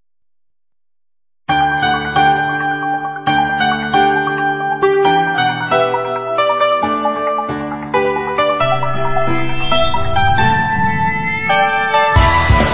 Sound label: ringtone; k BTminiPhone